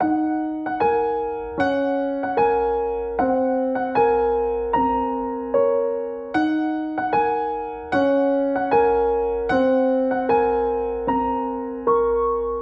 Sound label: Classical Piano, Ebm, 76bpm